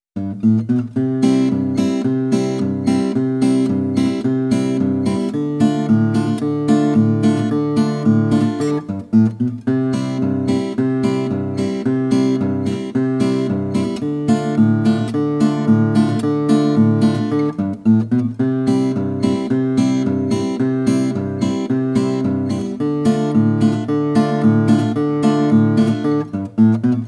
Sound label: walking aco